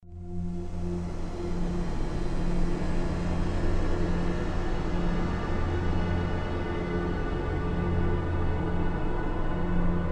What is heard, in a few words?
Guitar, Dramatic atmosphere; Ambient SoundScapes